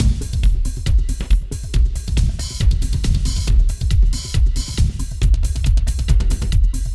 Clubbin' 138

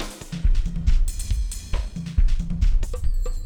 Jazzy Moods 138

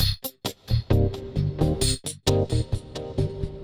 Funky 125-132 Cm7

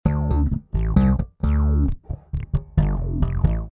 D 133 Funk

